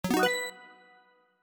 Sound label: win sound; VideoPoker